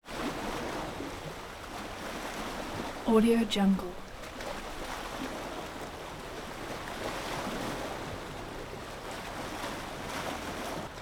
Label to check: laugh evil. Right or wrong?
Wrong